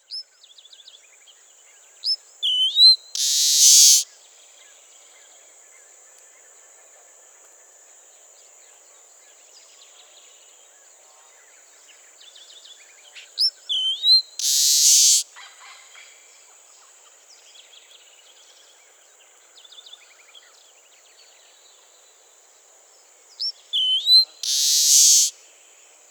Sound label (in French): Saturnelle militaire